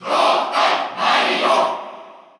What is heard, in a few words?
Cheer Russian; Dr. Mario; SSBU